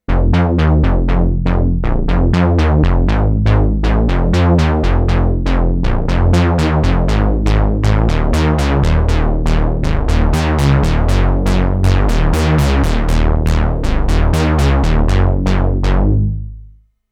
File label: Obese Bass, korg; dense